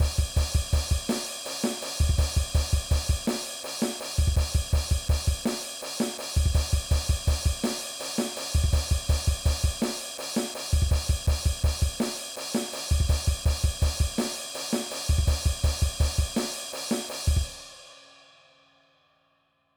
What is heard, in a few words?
Groove 68 Drum Beats